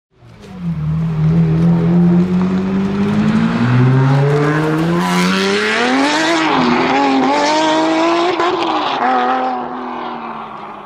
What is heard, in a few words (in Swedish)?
racerbilar